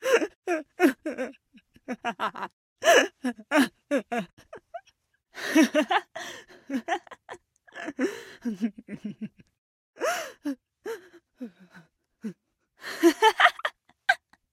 laugh female; dayz; kuru

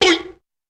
boink eye

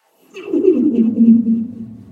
giggle

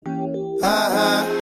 uhuh

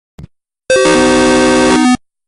retro clone sound

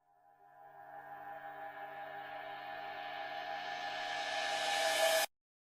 door; ruins

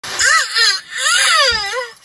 mini figure eating; td bricks

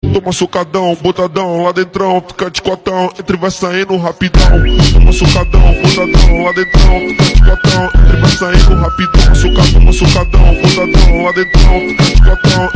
phonk braziliano

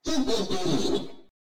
evil chuckle

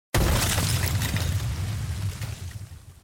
ice shatter

